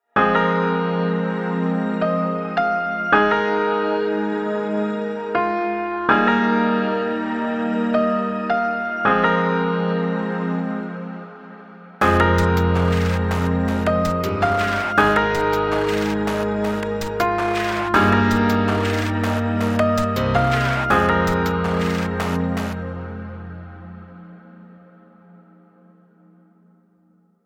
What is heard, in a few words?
piano